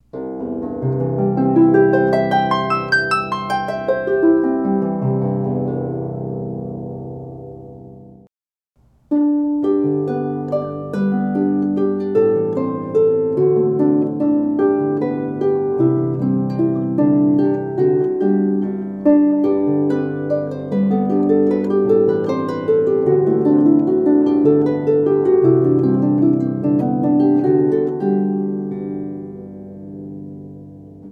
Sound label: Harp 34 String; Marion